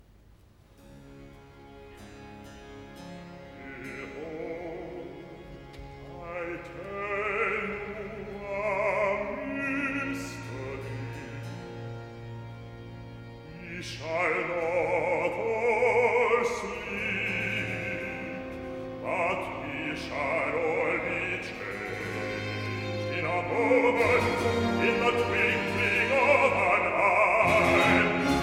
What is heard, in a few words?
Recitative-bass Behold, I tell you a mystery; Handel Masterworks